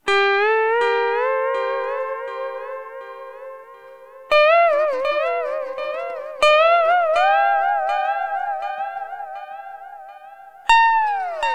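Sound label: Sunrise Guitar Fx D, Lounge; Zero-G Kocktail Kollection; Construction Kitz (Lounge); Sunrise Mixes 082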